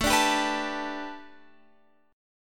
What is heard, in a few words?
A 9th Suspended 4th, down, Guitar, Open F; A9sus4 strummed